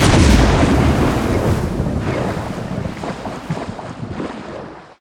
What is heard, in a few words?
nuke, skyrocket; xbmc4xbox; screensavers; rsxs-0.9